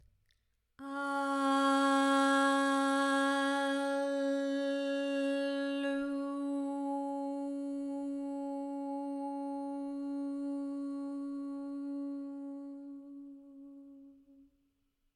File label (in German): Gesang Nordischer Schamanismus